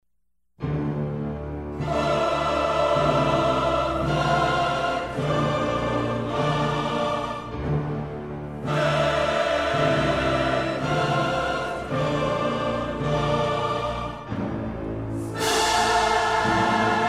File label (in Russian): zvuk hora s muzykoy